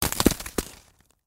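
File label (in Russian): зимний снег короткий звук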